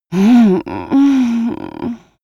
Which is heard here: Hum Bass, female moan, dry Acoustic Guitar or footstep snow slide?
female moan